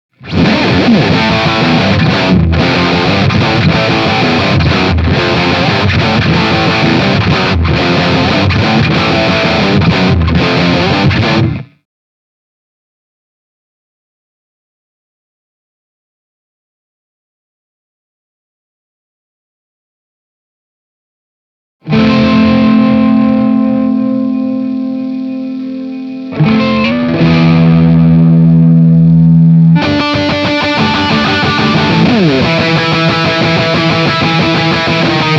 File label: Gibson Explorer into 4x12; Naylor SD60